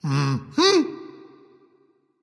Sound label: "ah", discover; smite; voicepack; ullr